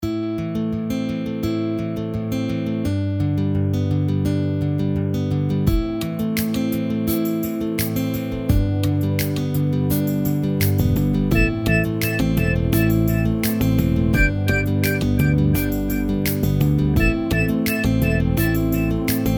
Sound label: Pop Hop